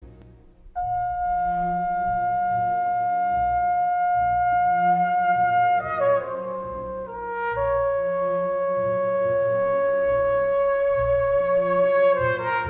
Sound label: Trumpet